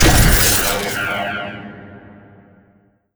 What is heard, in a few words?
player death; captainbigbutt; carbon miku